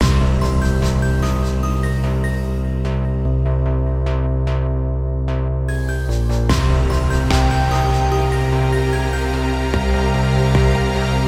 theme

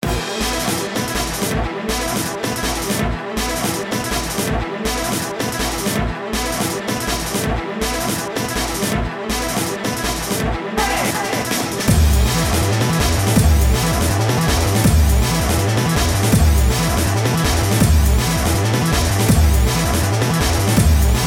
event battle fire

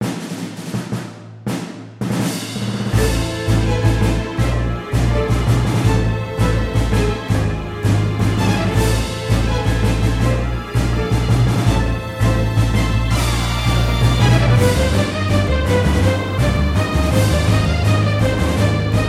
battle boss

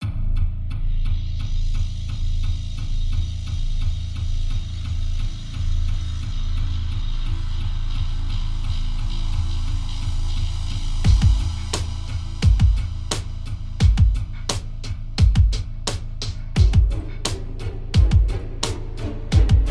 story frightening

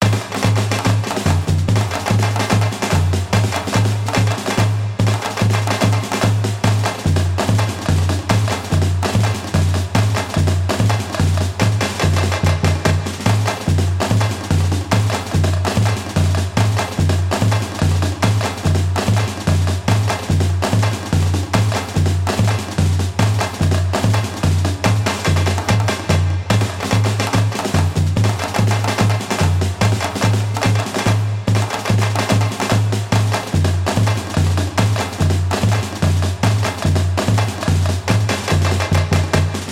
summer story fight sea

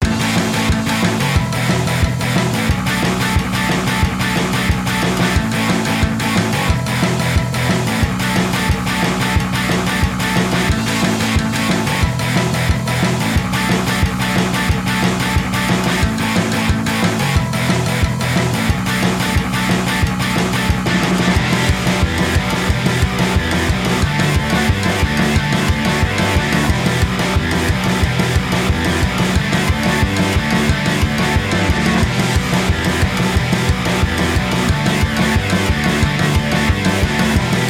summer battle normal boss

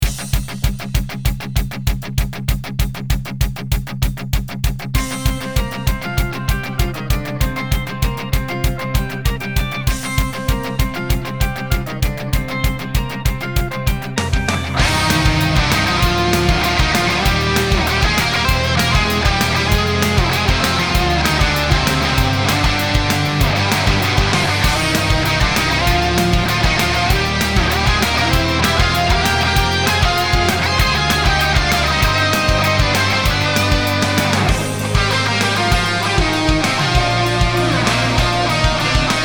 thunder battle; hairyu

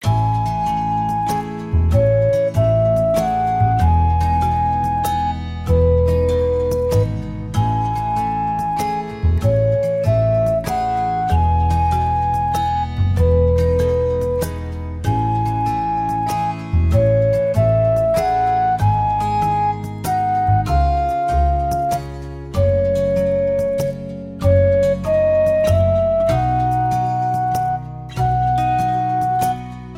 summer story calm

